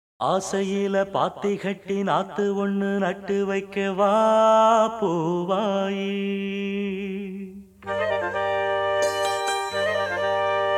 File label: (Duet), Tamil; Ramarajan